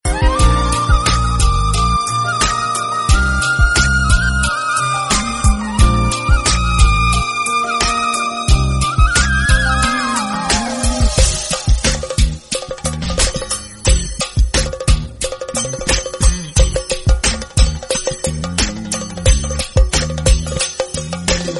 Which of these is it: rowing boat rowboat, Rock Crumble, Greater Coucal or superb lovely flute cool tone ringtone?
superb lovely flute cool tone ringtone